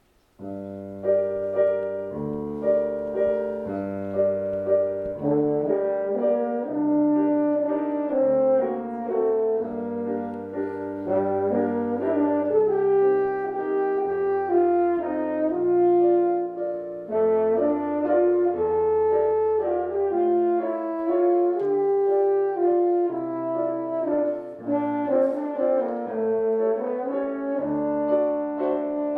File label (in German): Japanisches Lied, Horn